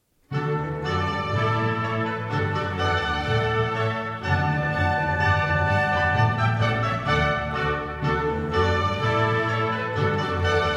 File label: Bach Orchestral Suite; Orchestral Suite4